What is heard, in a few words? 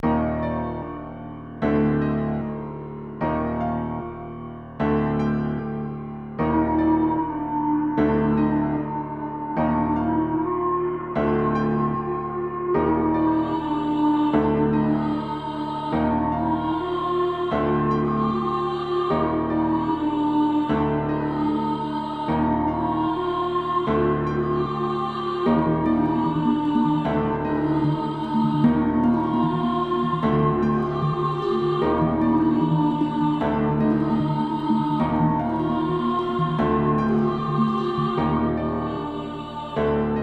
Drill Flute 151 Gm; Swarley